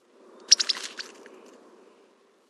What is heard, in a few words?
Uinta Chipmunk alarm skitter